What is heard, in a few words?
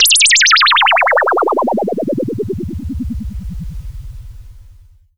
juno, Juno-60